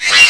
SYTHBUG; Easy Personal Web Page

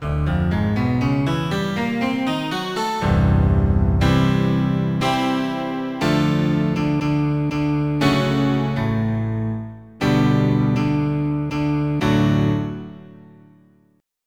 24 String Guitar

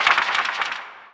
curse; allods2d

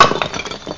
rubble; T2sounds

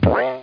BOING; dogz

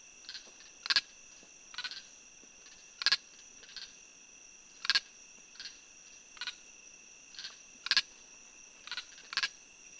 ins loop; DAoC

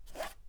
foley foot scuff; Earth and Beyond; EA Games

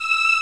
Choir Aahs E6; Hexen Demo; Hexen Music; Hexen 6vox

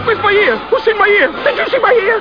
Sulk

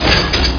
walker; yoda; PC Gamer 44

